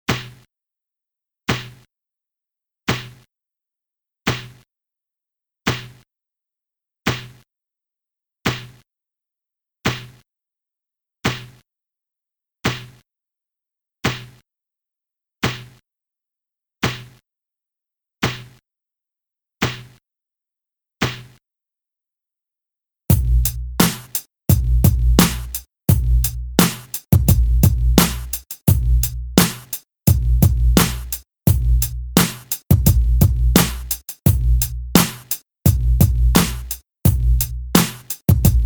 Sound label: HipHop Low SD and mix